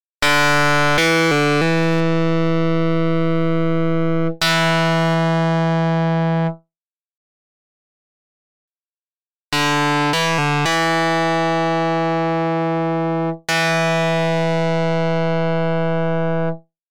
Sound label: Alias Vs Non Alias; Aliased and Non Aliased Distorted Guitar